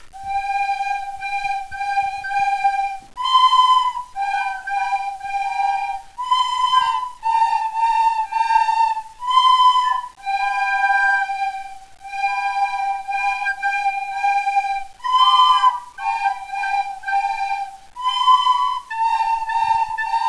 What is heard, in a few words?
cuckoo